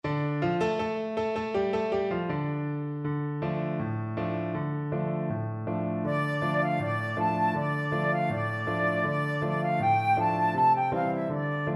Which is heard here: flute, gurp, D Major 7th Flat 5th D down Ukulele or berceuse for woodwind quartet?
flute